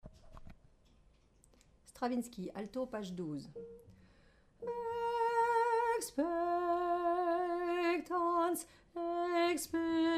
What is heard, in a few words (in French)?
psaume Alto